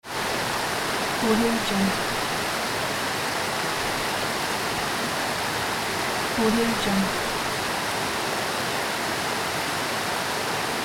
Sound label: waterfall; greenwaysound